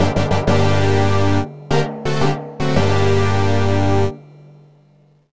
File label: Game Over; Part Time UFO; Android